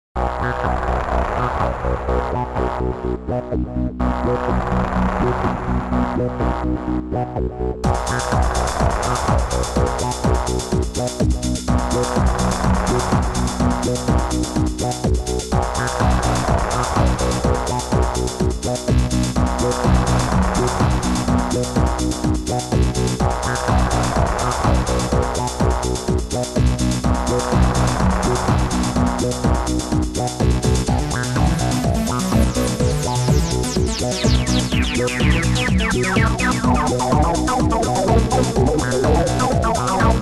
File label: MUSIC; Scene Demos